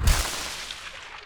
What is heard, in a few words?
MOVE; C64 Dreams; Multi-Sound Default